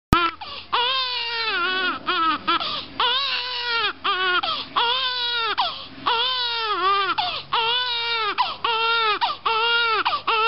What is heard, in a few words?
crying